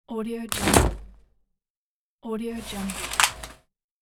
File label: umbrella open close; alexsandal